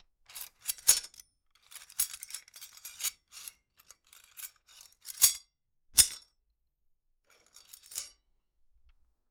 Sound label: repair metal clank tool fix